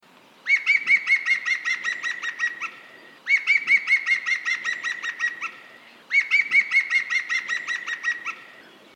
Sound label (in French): pic vert